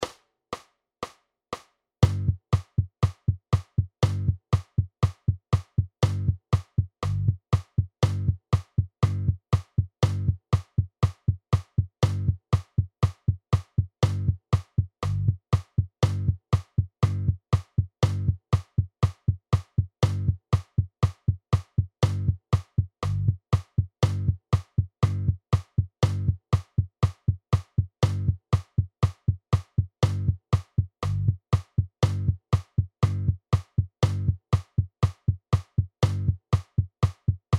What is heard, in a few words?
Play Along 120 BPM